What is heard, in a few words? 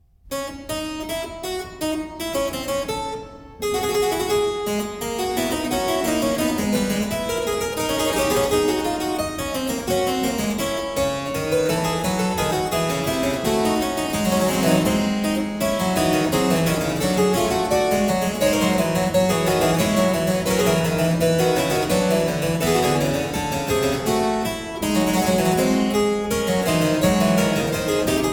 Fuga, Keyboard Works, The Well-Tempered Clavier; BWV 851 d II; Klassika; Bach, Johann Sebastian